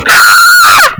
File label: short and loud scream